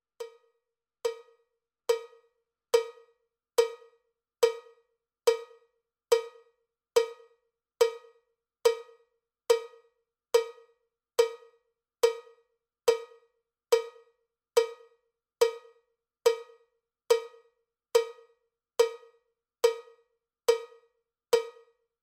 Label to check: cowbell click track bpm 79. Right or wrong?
Right